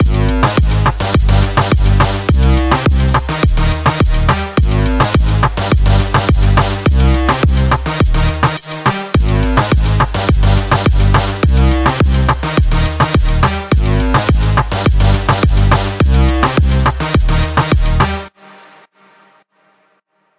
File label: Full Loop